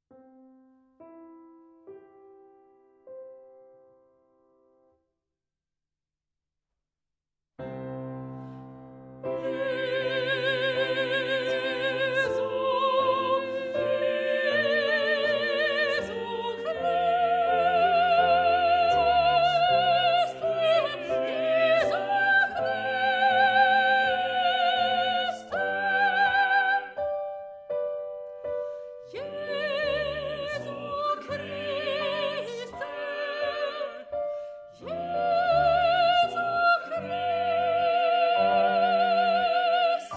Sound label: jesu christe soprano